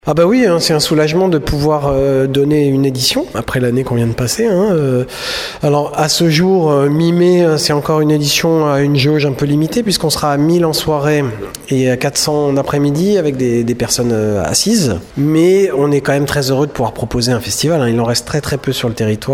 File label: brass